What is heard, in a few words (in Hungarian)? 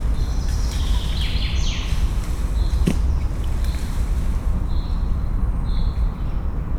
leugrik a bokorról, japán makákó; szegedi zoo 2011